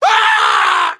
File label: scream, Screams; Voices; civ13; civ14